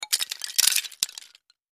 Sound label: Wood Shims, debris